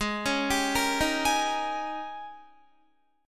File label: Ab Major 7th Suspended 2nd Suspended 4th Ab, down, Guitar; arpeggiated; JGuitar